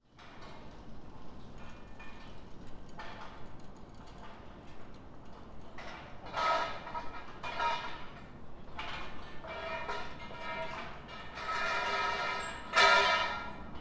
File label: bunkadori